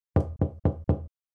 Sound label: Door Knocking Effect